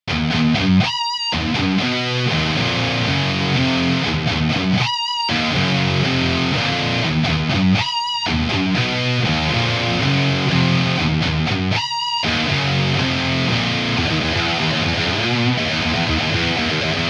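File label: OVERDRIVE RHYTHM Stereo; SaturnLO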